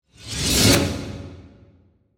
Whoosh Glass, Whoosh, Glass, Shards, Swirl, Production Element, Imaging Element, Accent, Transition; BlastWave